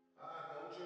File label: Drums Verb